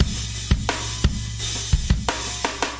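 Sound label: 85 bpm hard rock phat stereo, drum loops and breaks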